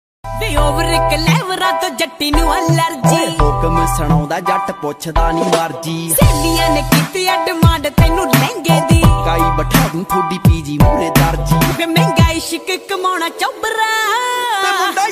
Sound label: Popular Punjabi Ringtone